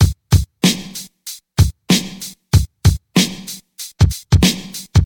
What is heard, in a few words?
95 bpm drum groove f sharp key, Free breakbeat sample - kick tuned to the F# note. Loudest frequency: 1628Hz